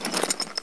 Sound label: Bone